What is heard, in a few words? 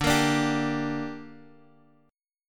D Suspended 4th Sharp 5th D, down; strummed